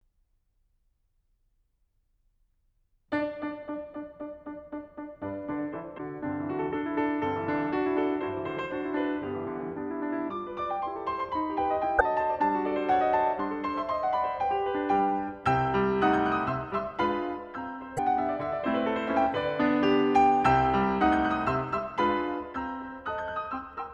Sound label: Finnish Folk Songs and Dances